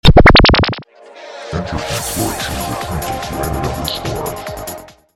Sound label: Mms Tone